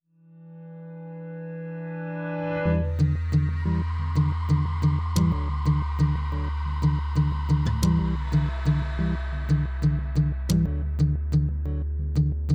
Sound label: no drum